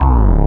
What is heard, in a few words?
bass, 170 BPM, drum & bass; Ueberschall; Drum & Bass Flash Trackz